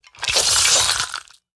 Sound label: Gore Metal Blade Underlay Attack